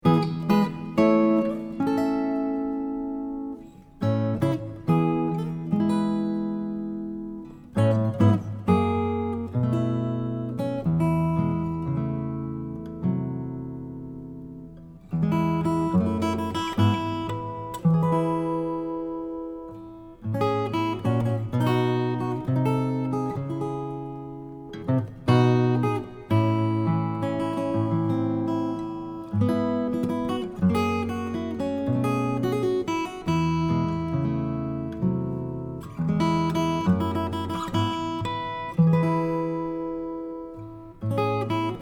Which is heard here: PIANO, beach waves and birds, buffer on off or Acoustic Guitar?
Acoustic Guitar